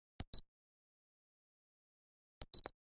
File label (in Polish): LAMPA POLIMERYZACYJNA